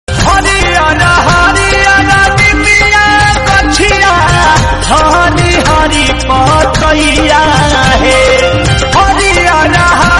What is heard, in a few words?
Bhakti